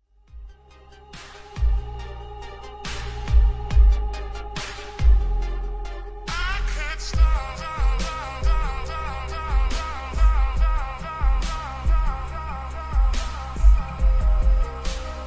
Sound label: clean bass boost